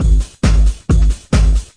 136 bpm, deep warehouse house, drum loops and breaks